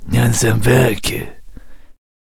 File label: Attack Vocals